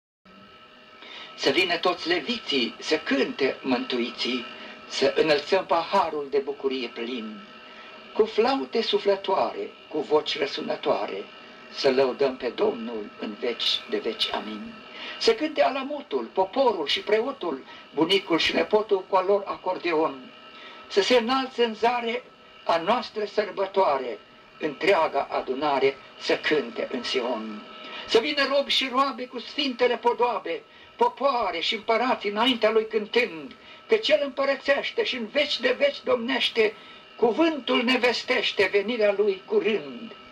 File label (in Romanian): Orchestre Si Famfare